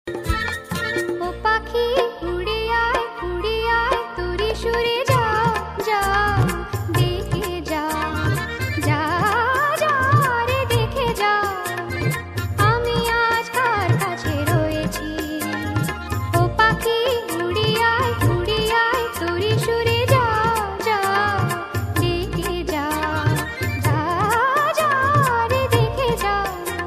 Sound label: bengali ringtone